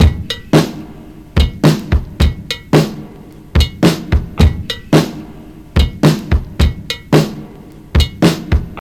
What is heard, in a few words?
109 bpm drum loop d sharp key, kick tuned to the D# note